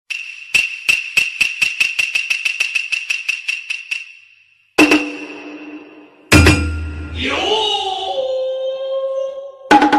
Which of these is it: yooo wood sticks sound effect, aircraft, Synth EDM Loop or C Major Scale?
yooo wood sticks sound effect